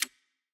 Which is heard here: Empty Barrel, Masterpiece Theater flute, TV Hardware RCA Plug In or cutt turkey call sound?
Empty Barrel